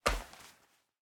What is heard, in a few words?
Gamepade Drop Player; Game rip; MiSide